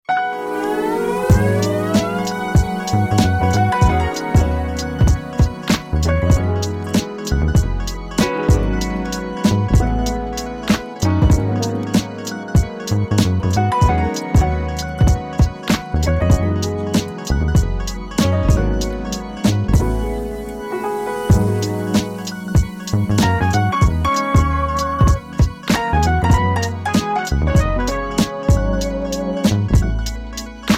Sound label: Ringtone, Samsung